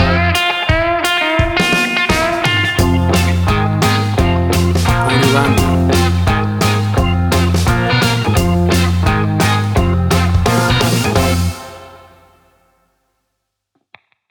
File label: 60s Mystery Man